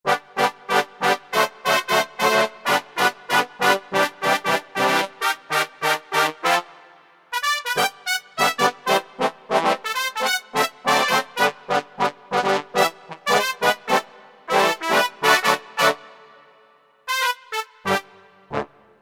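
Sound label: brass Tijuana; brs; E-mu Proteus FX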